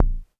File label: polyvox bassdrum; Formanta Radio Plant